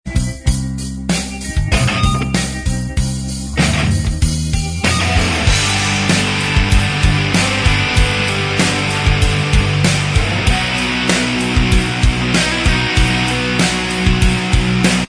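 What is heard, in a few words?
instrumental, backing track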